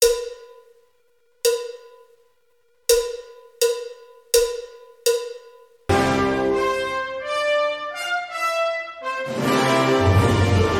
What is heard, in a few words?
Fanfare Tattoo